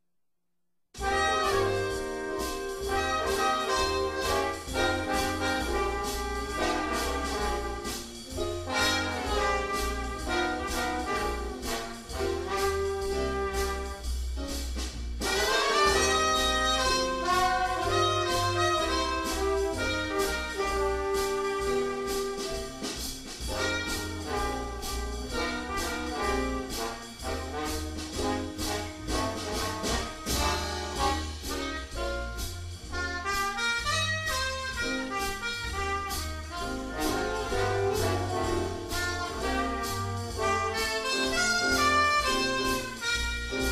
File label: Swing Jazz Combo; Premier